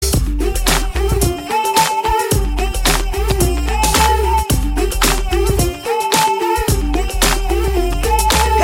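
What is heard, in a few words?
Theme background music Telugu Ringtone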